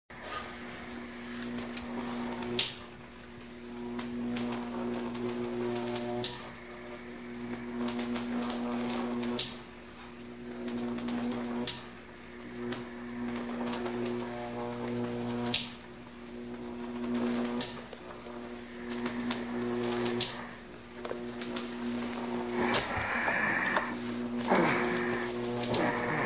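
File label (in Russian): дыхание монстра и звук какого-то аппарата; Звуки монстров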